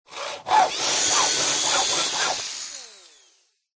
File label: Build; ZhenShiXunYouJi2